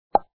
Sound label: sound Drag; Image Zone; sandwich